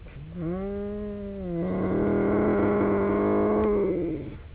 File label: Felis lynx; The call when threatening a human